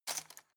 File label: cutlery plastic drawer grab soft